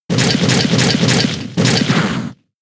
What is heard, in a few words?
Crunch; pokemon sfx gen 6 attack moves xy oras